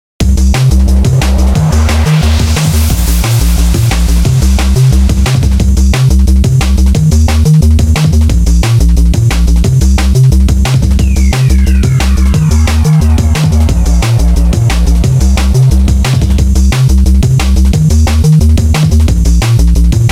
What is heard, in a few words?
dnb